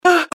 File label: hurt, npc male; gmod